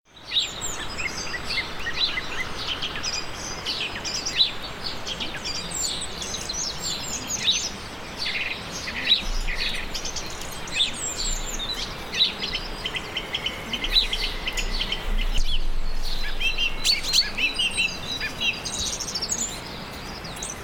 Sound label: Birdsong